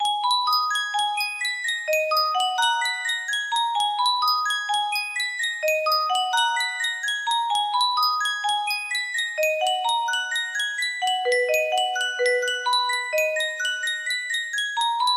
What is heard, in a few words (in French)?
yunsheng boite a musique